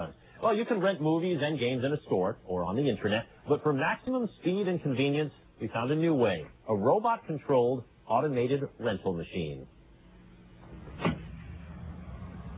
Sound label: modem